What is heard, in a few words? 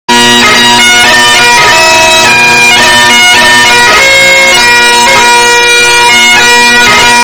bagpipes